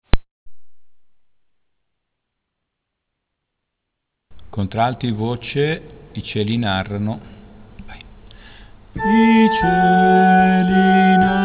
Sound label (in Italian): contralti; i cieli narrano